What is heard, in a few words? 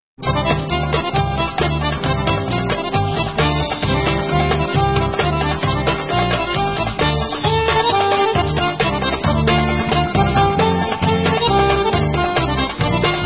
Debka Instrumental